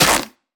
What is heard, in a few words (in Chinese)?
红树根破坏声